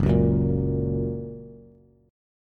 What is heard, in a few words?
Gb Major, Bass; Gb strummed; JGuitar